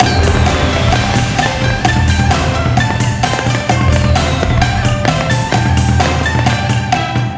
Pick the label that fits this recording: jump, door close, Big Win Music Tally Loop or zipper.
Big Win Music Tally Loop